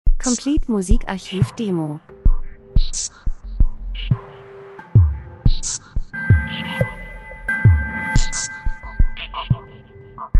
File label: drums; Variation Sounds Herzschlag Elektro Rhythmus Spannung Akzent Maschine Produktion Nachdenklich Dokumentation Industrie